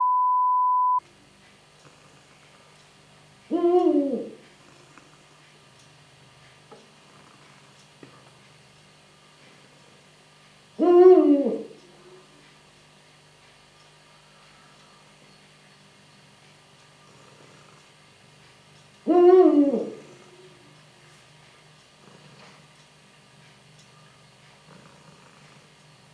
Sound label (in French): hibou grand duc